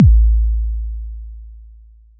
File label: longana, Kicks; single hits